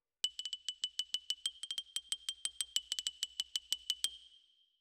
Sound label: Xylo Close